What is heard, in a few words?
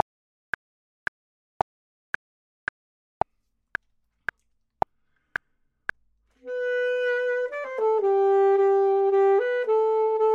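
top, Saxophone and Clarinet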